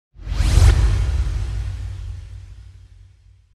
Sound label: transition futuristic teleport